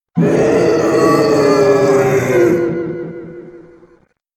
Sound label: scream